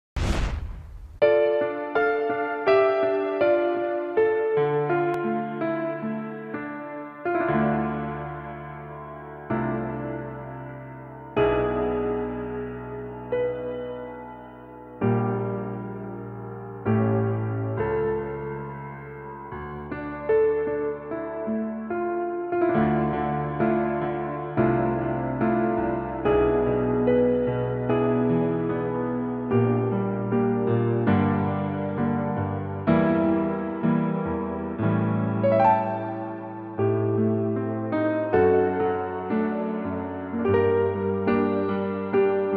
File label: minus karaoke